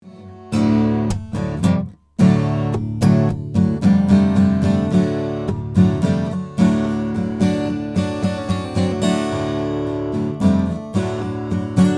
lowden guitar

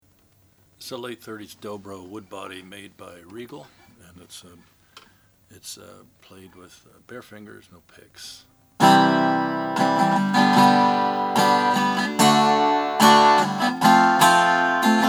dobro model 27 sunburst; 1938; regal

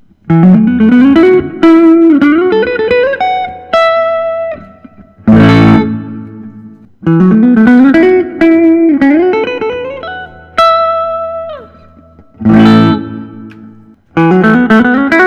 bartlett retrospec

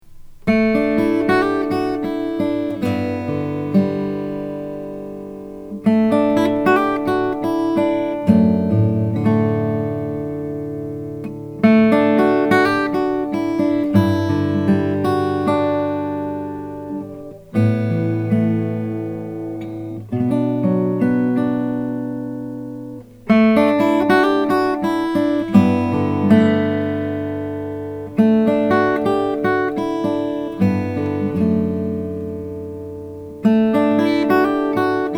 dudenbostel om 28 brazilian rosewood

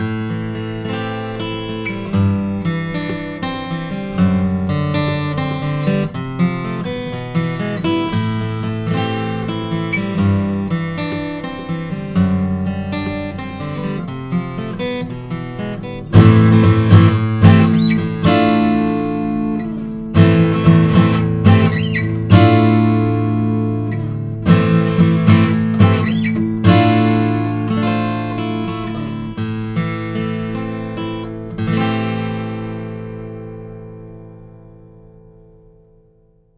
Martin hd 28vs natural with aging

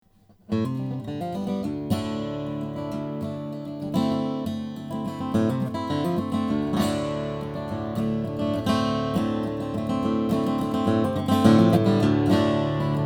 lowden o10 mahogany

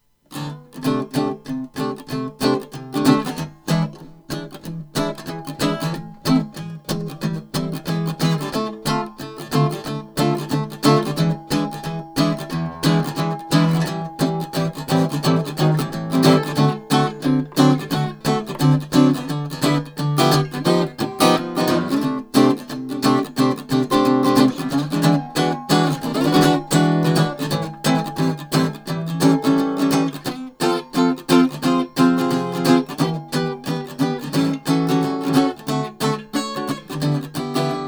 gibson l 5c custom sunburst

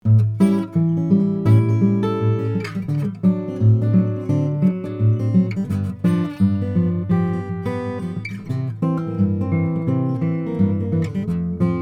bashkin om indian rosewood

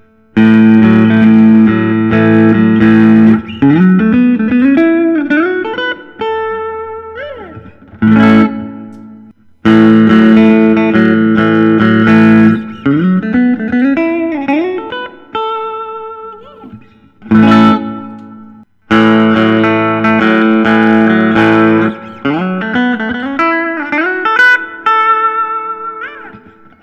gibson custom les paul special

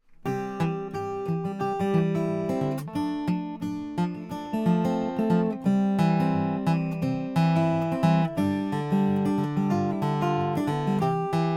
rainsong apse carbon fiber

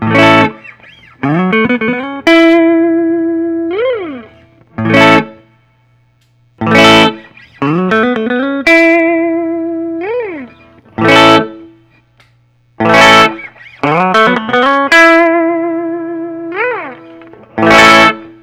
Gibson custom true historic 59 Les vintage

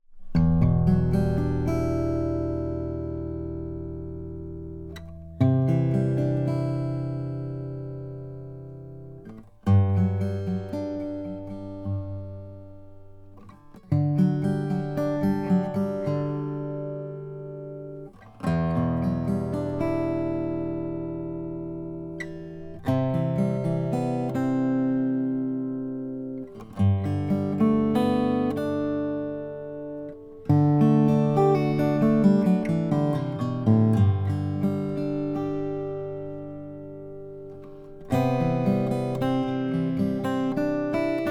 osthoff figured mahogany

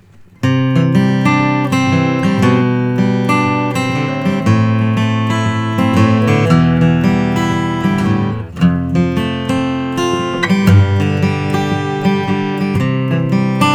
mj franks 000

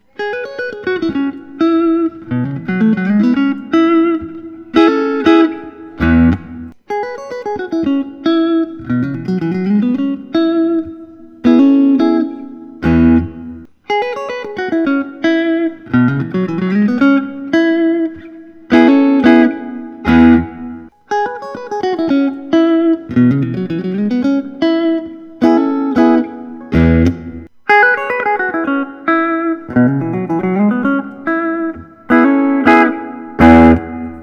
fender custom shop wildwood 10

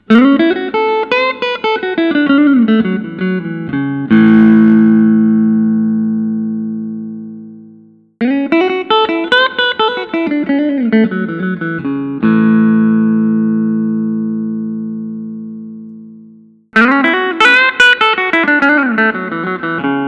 collings 290 pelham blue

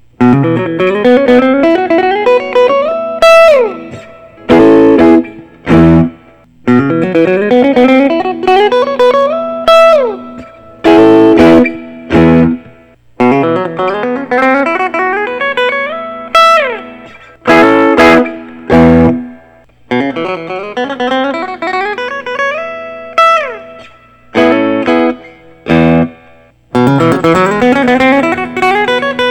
parker fly mojo flame purple burst

